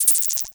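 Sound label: short noise; USB Soundscan vol.14 - Special FX Twisted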